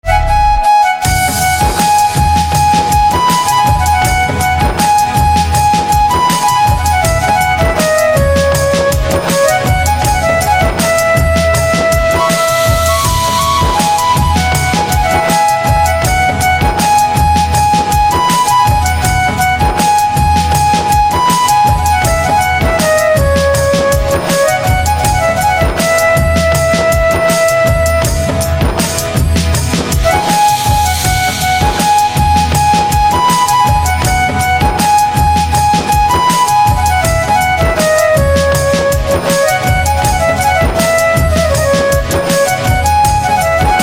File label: Flute